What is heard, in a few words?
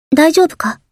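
attack; hibiki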